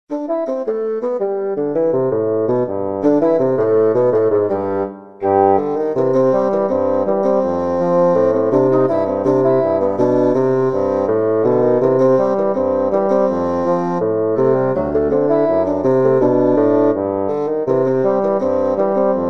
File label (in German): Fagott